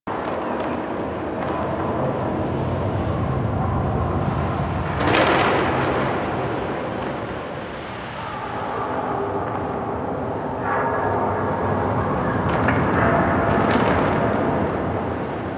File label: Fortress Exterior Amb; Warframe; Ambience; Grineer Fortress